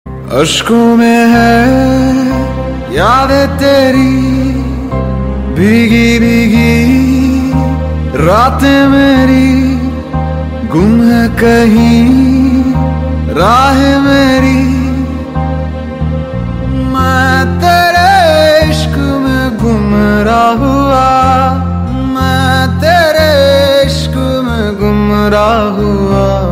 Sad hindi song Ringtone

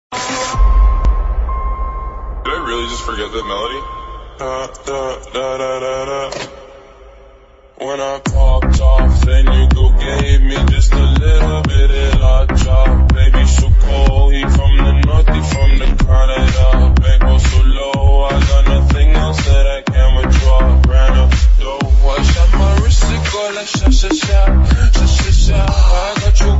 Attitude Bgm Ringtone

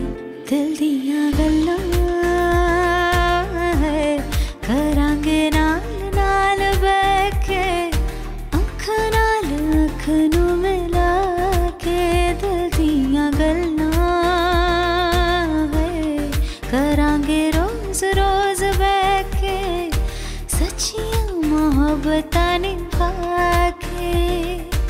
Female

